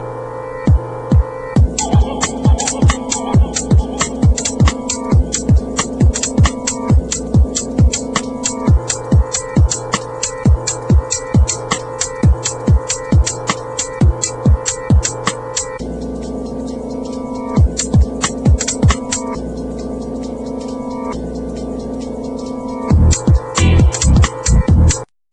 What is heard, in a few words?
bass side